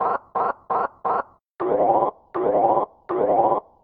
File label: tutorial warning lanes; Space Wave Race